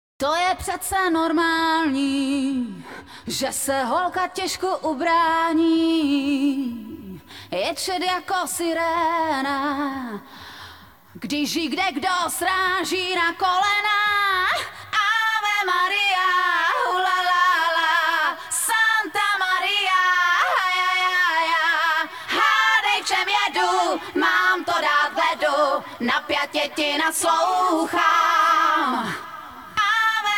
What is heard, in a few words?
ave maria acapella